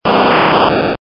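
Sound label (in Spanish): "Grito de Arbok", Pokémon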